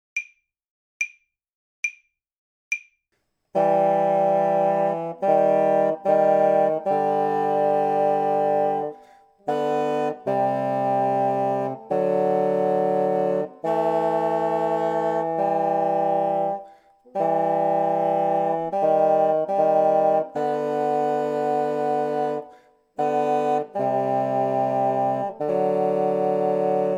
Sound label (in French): poeme 3 bassons, basson; poeme trio